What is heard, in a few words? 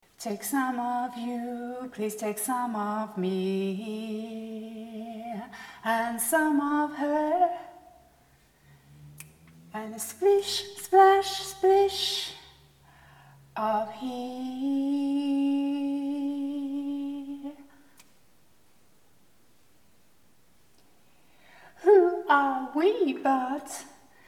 Emergence Sea with MMT (Clean recordings)